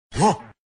Baby Grunt

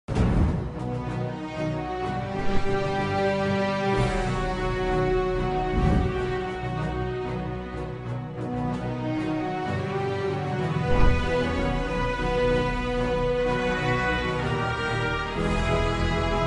Anthem; Panem

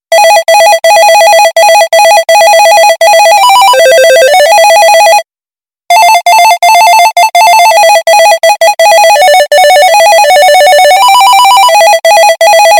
Jingle bells phones

